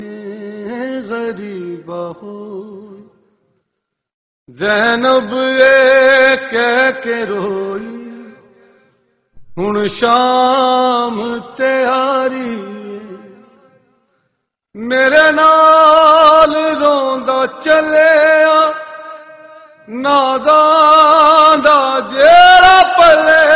noha tone

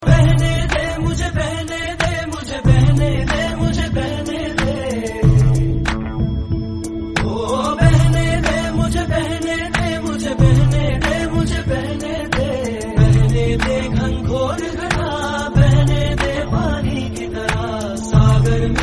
inspirational hindi song tone ringtone; bollywood

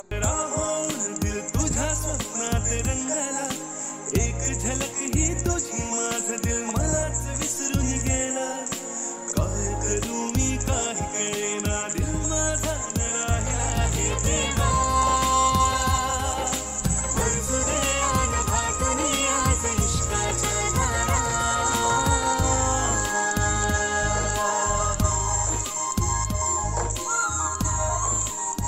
marathi ringtone

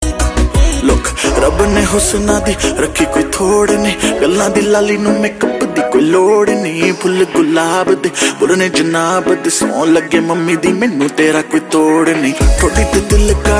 bollywood rab ne ringtone

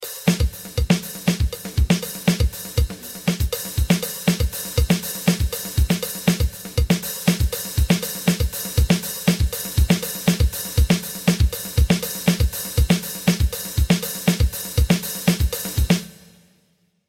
latin drums ringtone

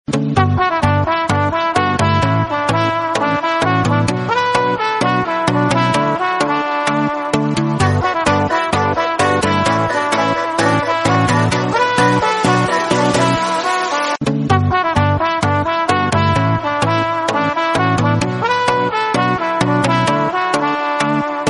saxo love ringtone

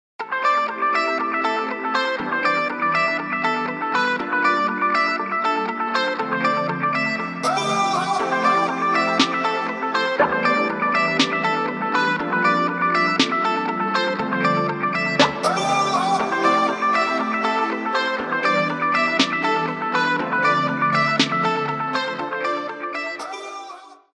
samsung galaxy s9 ringtone

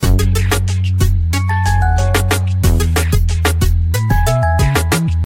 friendly ghost ringtone, sms

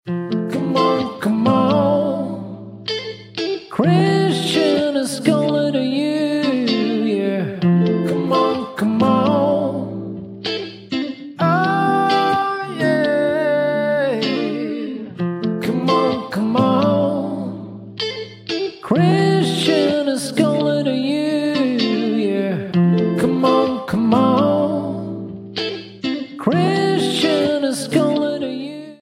acoustic ringtone

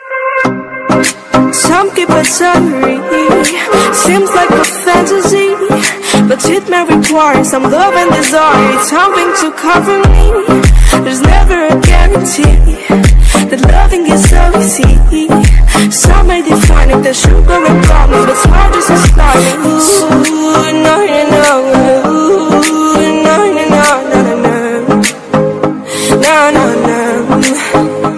english status ringtone; whatsapp; english ringtones

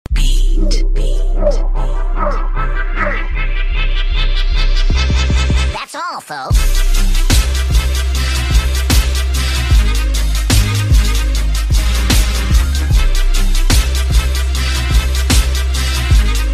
cartoon instrumental ringtone

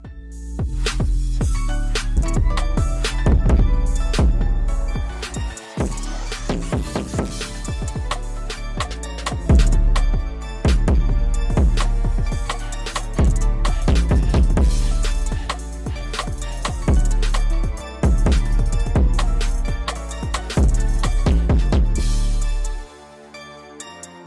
nokia ringtone; nokia 230 daue

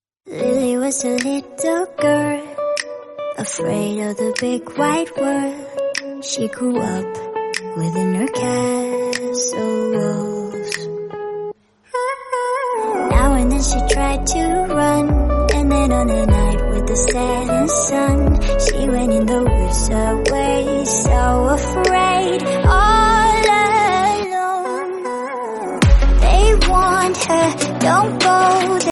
kids song tone ringtone

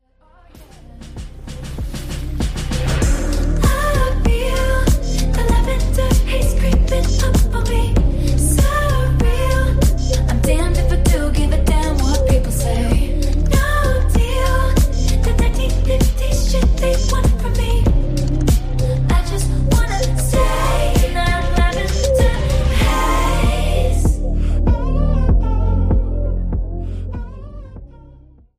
romantic love english song tone ringtone

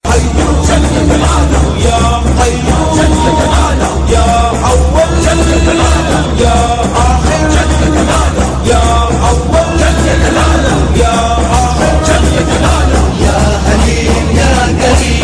islamic ringtone

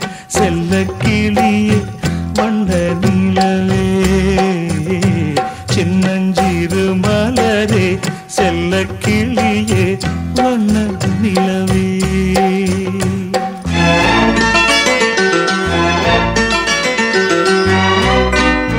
tamil music mix ringtone

